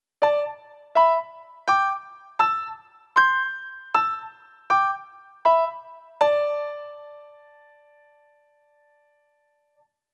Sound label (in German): CHINESISCHE QUINTEN d-a TRP